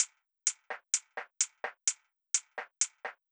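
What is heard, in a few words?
Drum Loop 128BPM hi-hat; VEC5; Drumloops; Multi Loops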